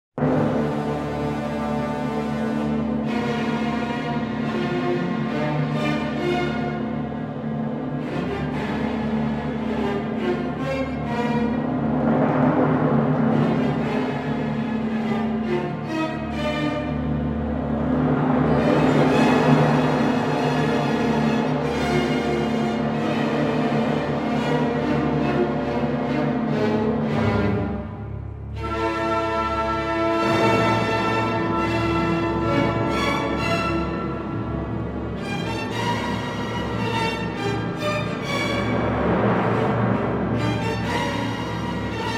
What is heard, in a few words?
Piano Concerto No. 1 in D minor; Brahms